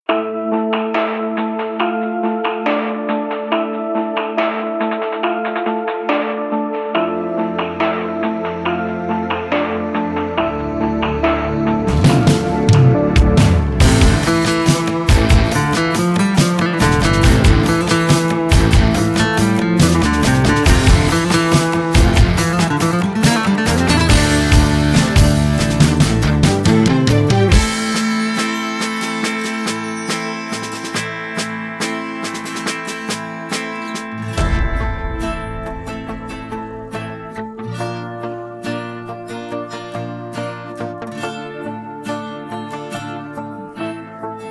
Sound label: instrumental; persian